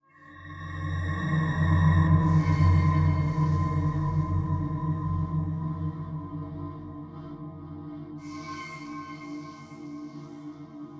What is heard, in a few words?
Jingle Stage Clear